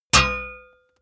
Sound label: metal impact sword plate; hits explosionen; metall